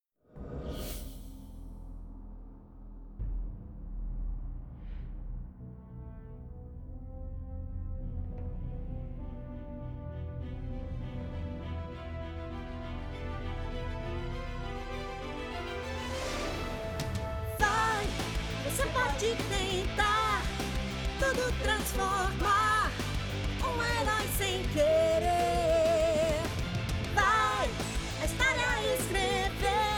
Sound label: Opening - Portuguese; Wakfu S1